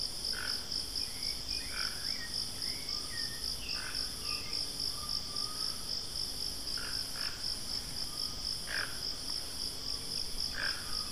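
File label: Ramphastos toco, bird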